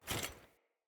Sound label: Foley Action Armour Jump Land; Player; Deora Armour